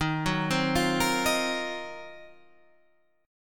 Eb Minor 6th Add 9th Eb down Guitar Standard; arpeggiated

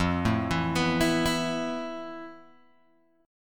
F Major 7th Flat 5th, Guitar Open G; down; chord shape

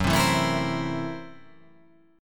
F sharp 7th Sharp 9th Flat 5th; strummed; JGuitar

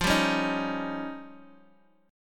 F 7th Suspended 2nd Sharp 5th, Guitar, Open D

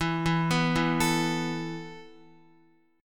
E Suspended 4th E down Guitar; Esus4 arpeggiated

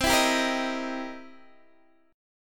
C 7th Sharp 9th, Guitar, Open D

